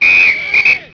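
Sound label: referee whistle; Microsoft Graphics Studio; Home Publishing 99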